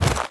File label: leather pickup, inventory; ui